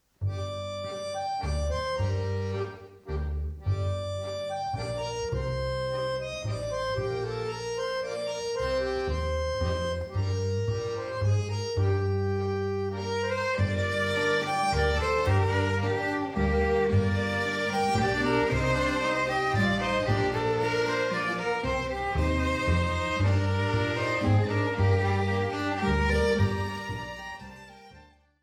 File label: Waltz